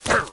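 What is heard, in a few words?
barbarian footstep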